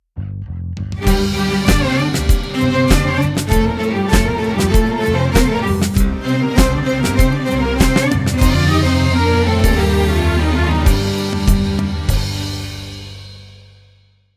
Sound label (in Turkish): keman